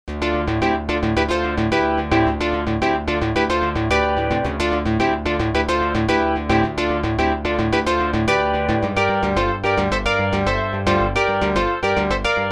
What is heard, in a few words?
karplus, sampler